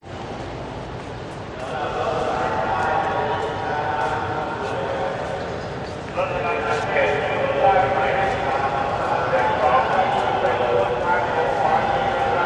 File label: Thailand Crowd; audio hero